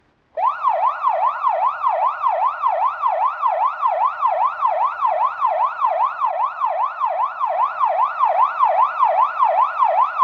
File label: Siren, South African Sirens; TheWorkRoom Audio Post